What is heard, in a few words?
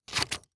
Scissors Cut Paper Single Cut, Household